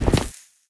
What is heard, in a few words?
labyrinth revival stage candle off